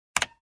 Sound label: Computers